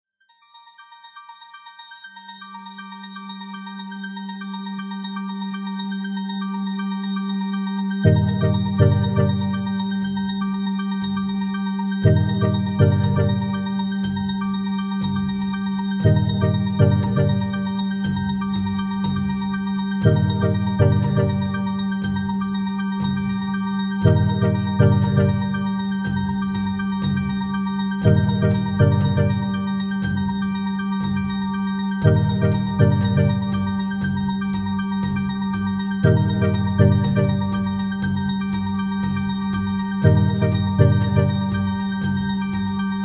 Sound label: rhythm